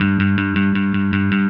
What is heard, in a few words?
Happy Pub Bass